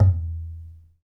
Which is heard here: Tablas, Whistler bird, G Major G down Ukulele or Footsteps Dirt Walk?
Tablas